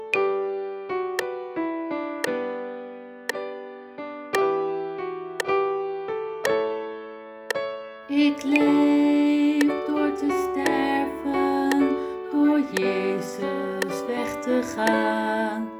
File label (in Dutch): Click Track voor het laatste refrein Tenor partij